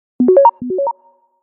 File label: Pool, Ringtones; Nokia; Asha 301 Southeast Asia